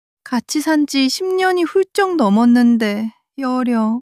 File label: crying; monologue